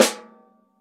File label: SD1 -L, Drums Acoustic; AKAI; 90s sample CD; USB Soundscan vol.10; RAW KIT 4